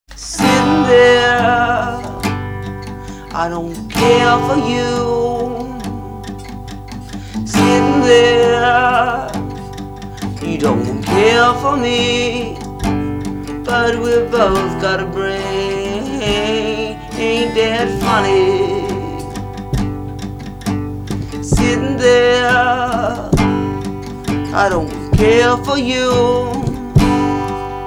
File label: Solo Acoustic